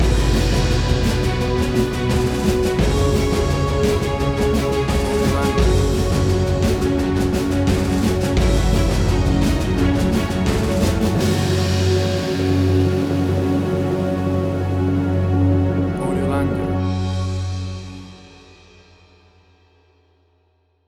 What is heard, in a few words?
Big Action Movie Trailer Sound